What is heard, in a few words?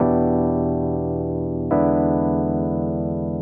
El Piano 140 E, jazz keys, Chordy Loops, 140bpm